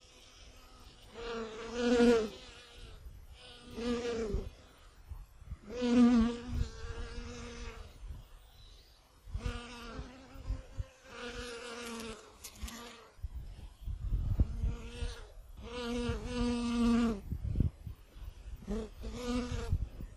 vol abeilles lavande

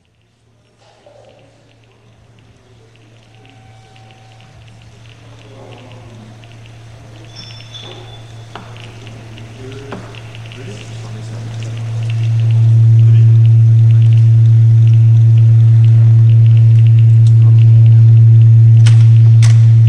Soundscape; ENSA; Duos & Trios

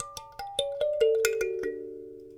SEQ Piano Pouce Gamme Descendante Stereo

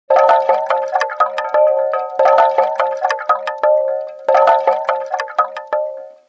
Extincteur; RingTones

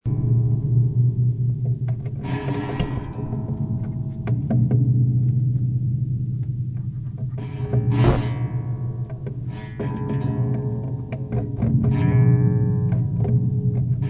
Accordage Théorbe; Electroacoustique

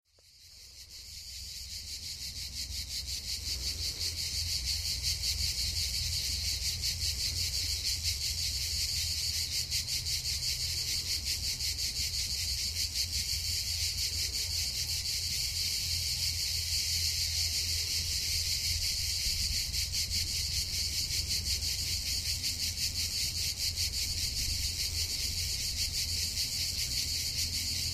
Cigales

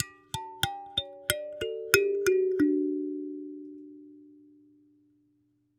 SEQ Piano Pouce Gamme Descendante

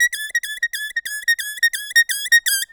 LOOP Couinement Toutou Baudruche Mono

